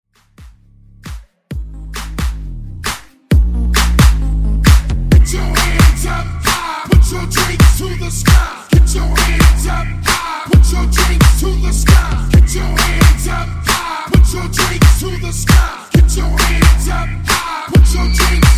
Hype 133 Bpm; Redrum

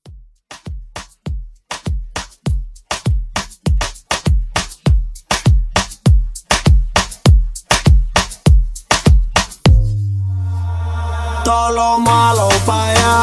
Transition 100 126

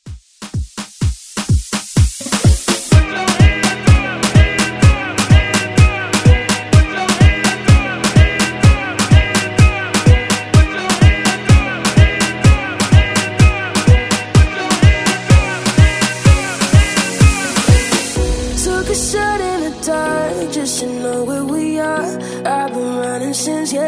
Hype Redrum

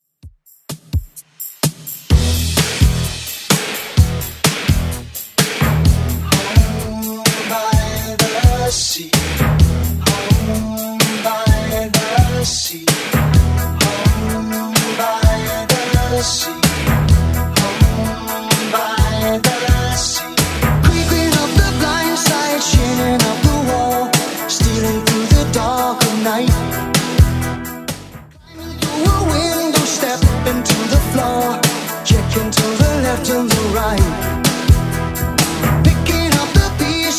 80s Rock Redrum 128bpm

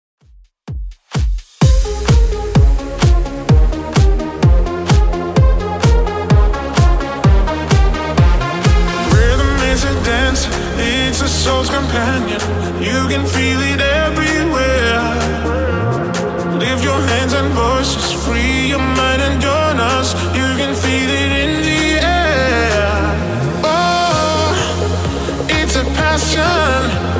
House Redrum 128bpm

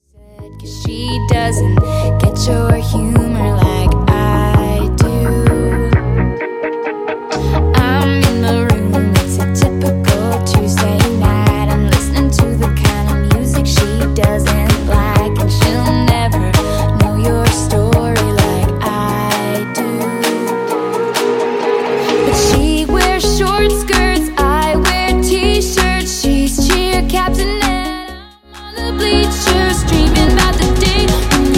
Top40 House 130 bpm

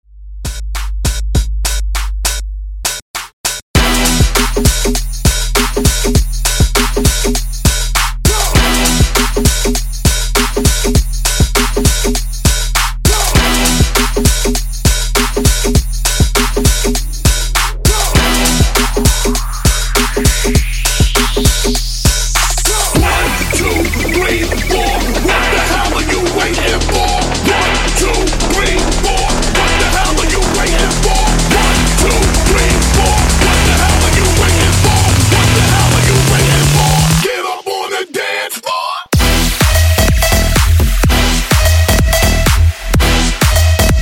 100 126 Transition Redrum

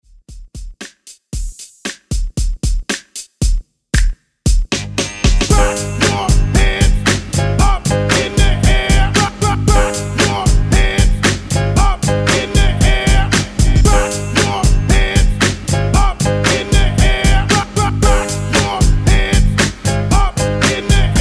60s Hyped Intro Outro 115 BPM; Redrum

